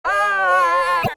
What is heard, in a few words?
stun, spirit; slender; dbd